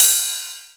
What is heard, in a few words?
RID, Korg Electribe EMX-1